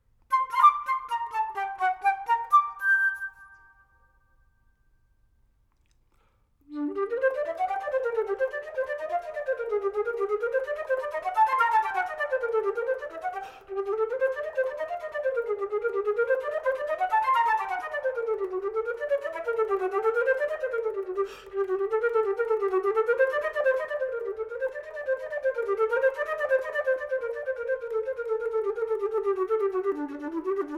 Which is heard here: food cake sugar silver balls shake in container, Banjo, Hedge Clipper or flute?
flute